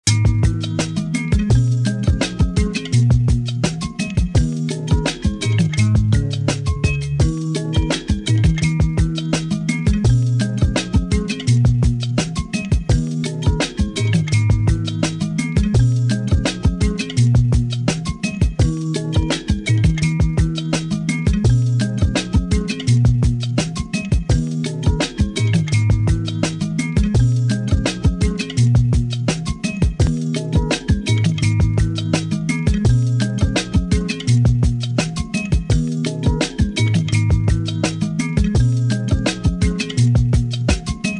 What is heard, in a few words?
folksy fingerpicked guitar with vintage vocal